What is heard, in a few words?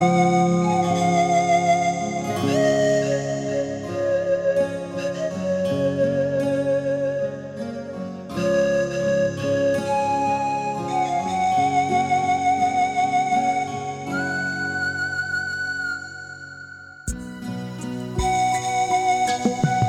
Pan pipe